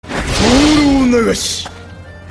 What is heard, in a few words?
wind; battle